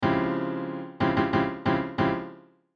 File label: Opening Chord